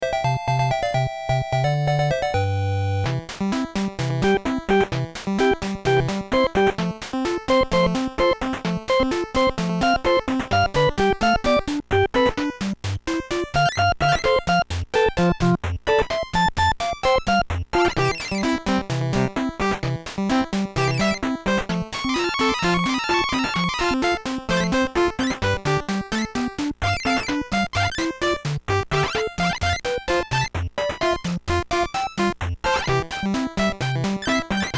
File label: Beat Block